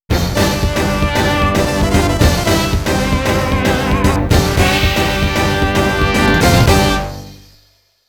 Mission Clear; Mission 2 Clear; TAITO SOUND BULLETS (2025)

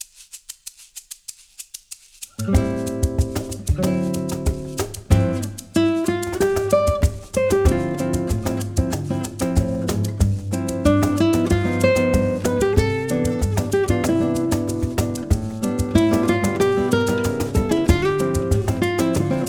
(Bossa Nova Version); Mario Kart World; Koopa Troopa Beach; Super Mario Kart

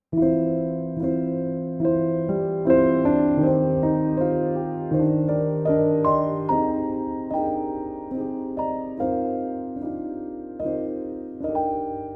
Golden Fields (Piano Version); Infinity Nikki; Infinity Nikki Game Original Soundtracks Vol.13

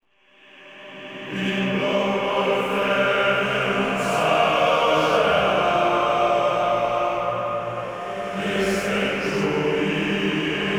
Door; Forgotten Realms Demon Stone; PS2

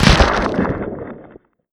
Twist

